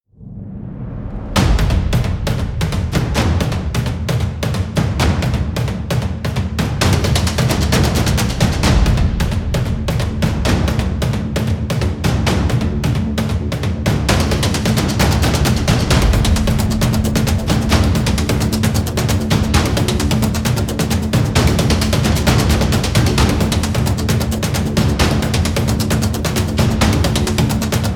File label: Epic Action Drums; True Blades